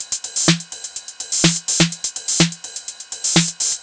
drum noclap top 125, Drum Loops, Classic Chicago House